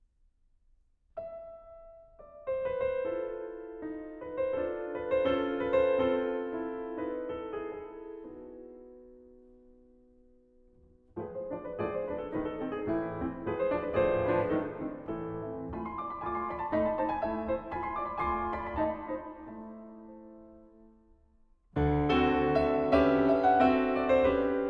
Norwegian Folk Songs, Cattle Call and Lullaby, Piano; Grieg